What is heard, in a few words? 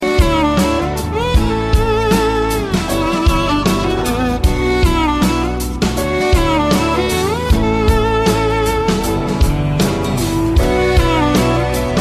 touching violin; Electronica